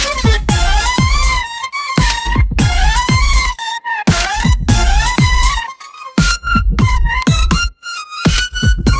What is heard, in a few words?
electronic synth lead bella ciao